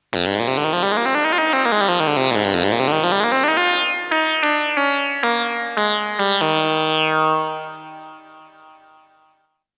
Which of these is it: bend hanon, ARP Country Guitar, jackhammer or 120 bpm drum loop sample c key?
ARP Country Guitar